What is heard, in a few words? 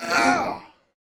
Hit React Centaur